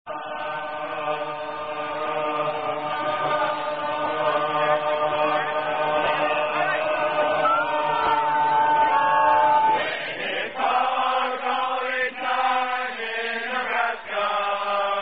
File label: Hog-Calling At The 2008 Insight Bowl, Hog Calling Time In Nebraska College Marching Band